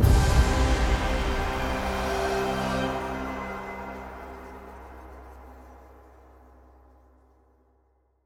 outro Free Spin; netent

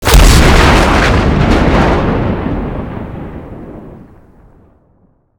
free Spin Triggered; netent

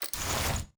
splash Screen Roll Up; netent

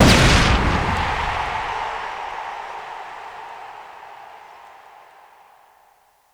big win text change; netent

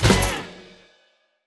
small Win

